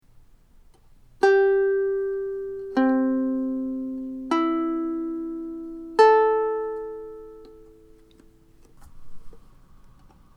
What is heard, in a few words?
Sycamore walnut pineapple, ukulele